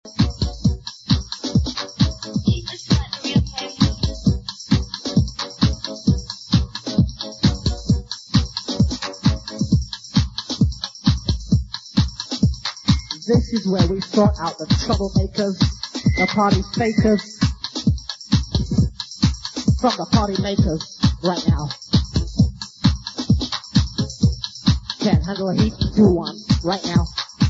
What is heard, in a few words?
void piano samples; Amnesia House; Sports Connexion Coventry June 90